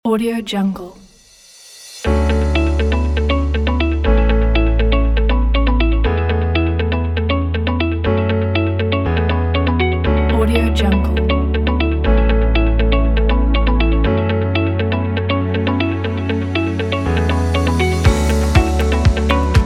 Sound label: corporate tech